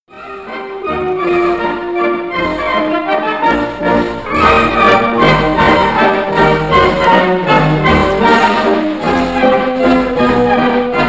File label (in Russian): Mazurka Medlenno